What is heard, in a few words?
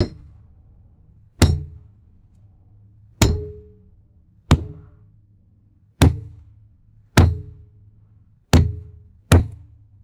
heavy metal pipe striking soft body, fight